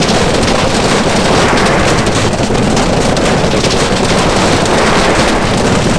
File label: roll tin roof; terrains; Tony Hawk's Project 8; XBOX